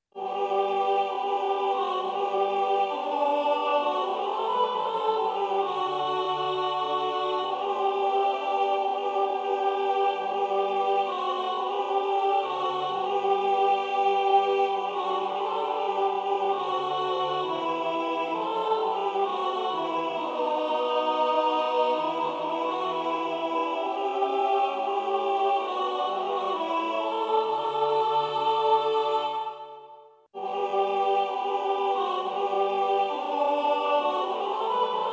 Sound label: canticorum alto; Chants